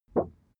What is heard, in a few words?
cardboard wobble short medium